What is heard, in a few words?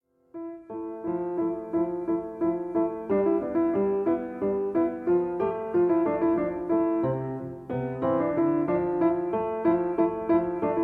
piano